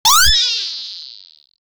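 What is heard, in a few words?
old school rpg slime projectile; cartoons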